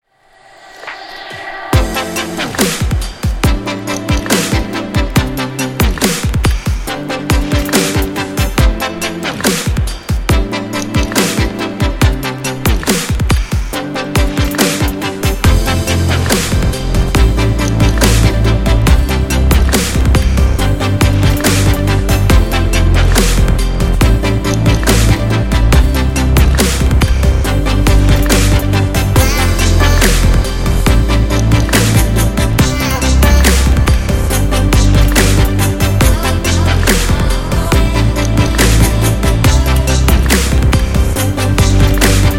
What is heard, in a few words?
Future Bass